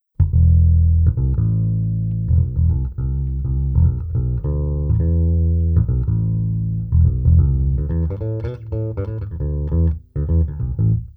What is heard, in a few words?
Bass play; Бас гитара.; Tестовые сигналы и звуки.